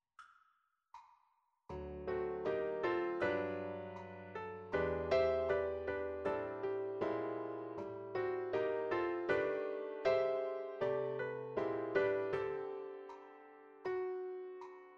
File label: Danny Boy chords